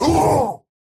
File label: pain big; Slork